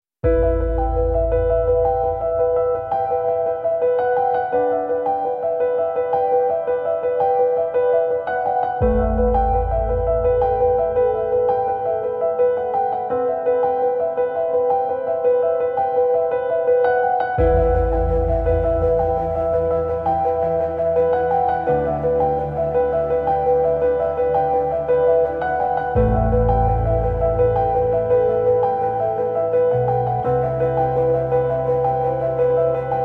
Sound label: Dramatic